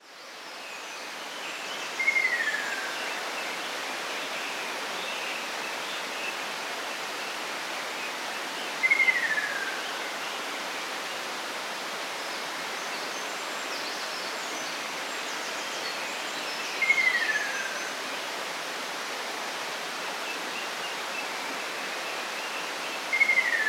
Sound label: Ruddy Kingfisher